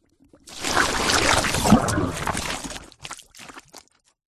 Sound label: cartoon slime big movement squelch groan; zapsplat